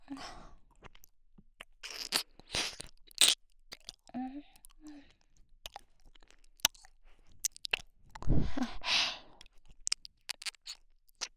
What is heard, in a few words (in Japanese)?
耳舐め(右)ループ